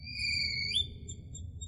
Chrrrip Chp Chp Chp

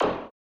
Hammer

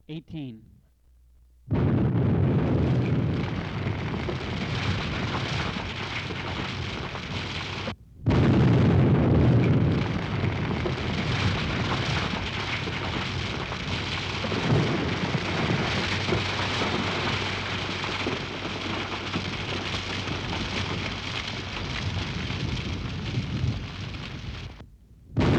Explosion With Debris

